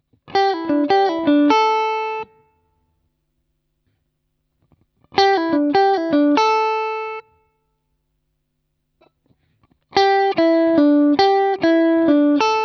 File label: Lick, guitar